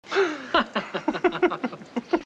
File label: laughter